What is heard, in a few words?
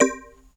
DX200 Bell Hi Vinyl Synths Cmin9 C3; vinyl chords